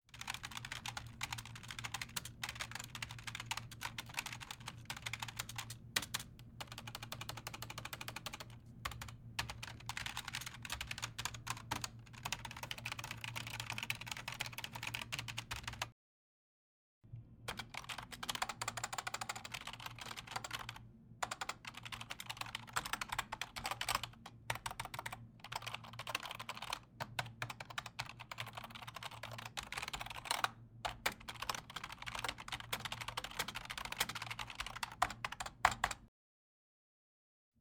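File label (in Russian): звуки печатания на клавиатуре компьютера набор текста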